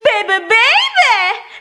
baby baby, Voice; ooh ahh

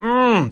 mmm

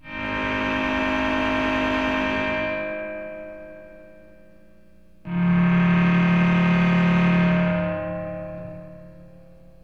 keys, Loops, Sad

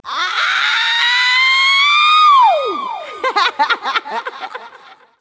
ah ow laugh; ooh ahh

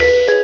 doorbell

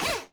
drill

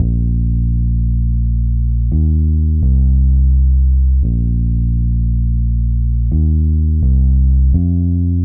bass, Loops Phonky

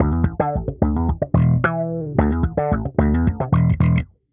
bass; Phonky

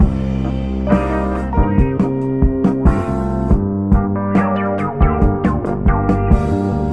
guitar, loops; Phonky

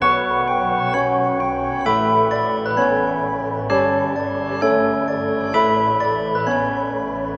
keys chiming in, Loops Sad

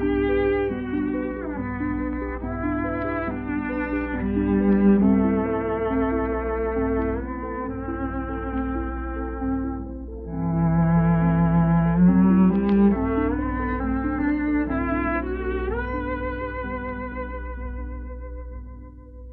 strings swarm; Loops; Sad

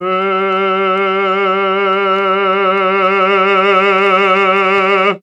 rounded power, Voice

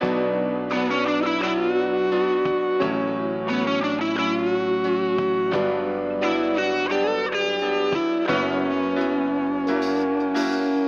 guitar

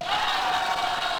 "woah" crowd

